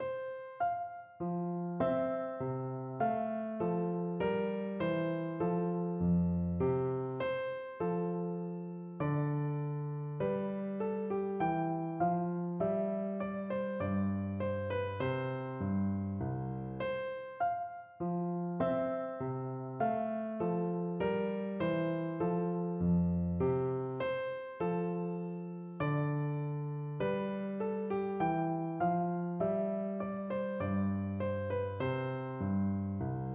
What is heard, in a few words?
piano